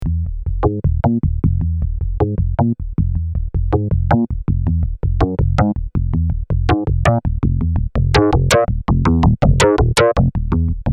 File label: Reface DX Out Level Test FB am Ende